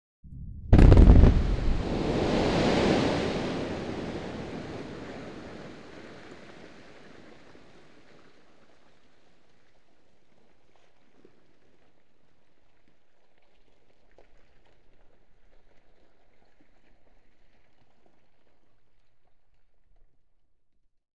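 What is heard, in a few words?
Quarrying, Explosion, Single Blast; BBC Sound Effects Library; Explosions Guns Alarms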